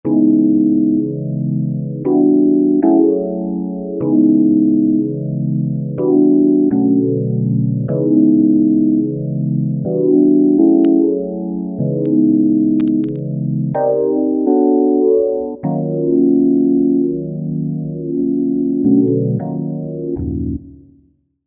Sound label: 77 Garages